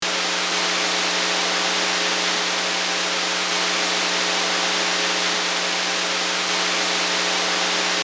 Vintage recorder noise

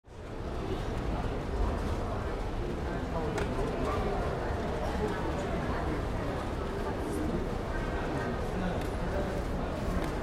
Crowded airport lounge crowd chatter

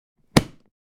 Small plastic box open

